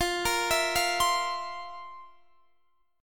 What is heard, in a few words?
F Major 7th Suspended 4th, Guitar, DADGAD